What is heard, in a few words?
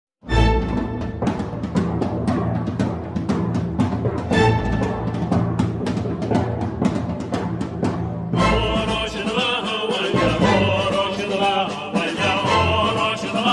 Irish Civil War Song; Óró! 'Sé do bheatha 'bhaile